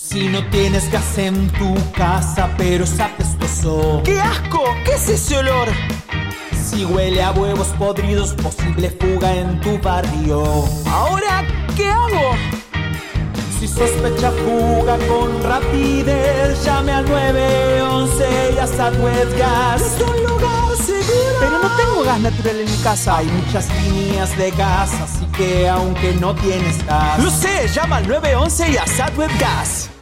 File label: Jingle Spanish